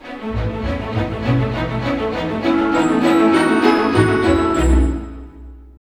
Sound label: BIKE; East West; Scoring Tools; ORC; Bike Ride A